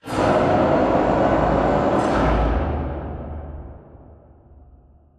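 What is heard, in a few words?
Assembly Furniture Rail Distant; Boomtown; Titanfall 2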